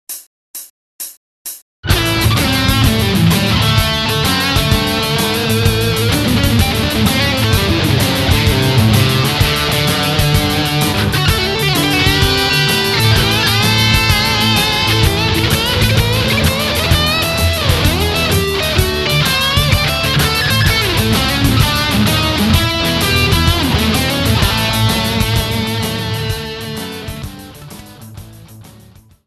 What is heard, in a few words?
heavy blues, guitar